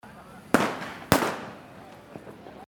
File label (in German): Silvester Knaller